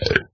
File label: burp; Minecraft